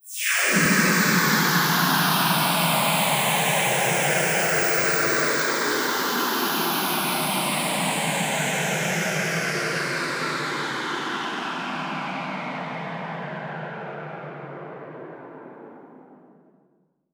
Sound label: Sweeps Down